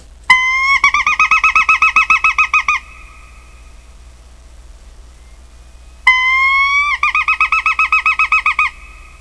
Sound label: woodpecker